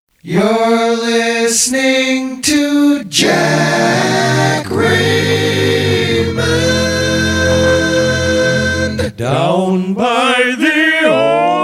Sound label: 70s rockapella